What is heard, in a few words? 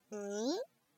fungome calm